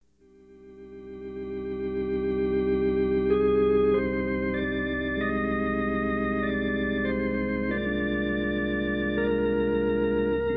Funeral Organ

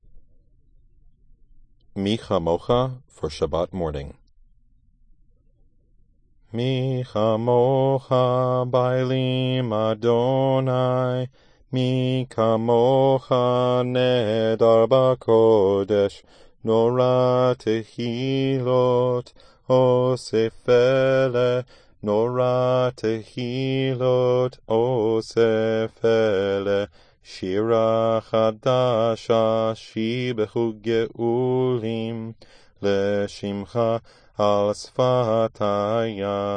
Mi Chamocha sung

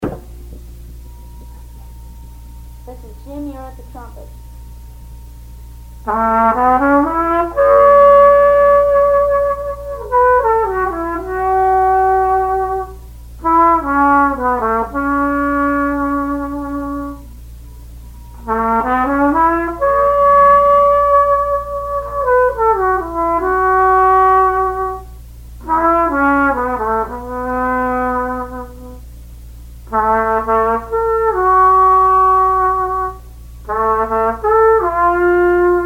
Trumpet Piece

